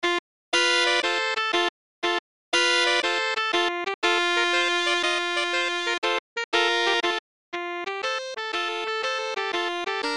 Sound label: Sax Trio